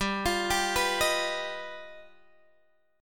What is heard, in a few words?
G Augmented 7th, Guitar Open G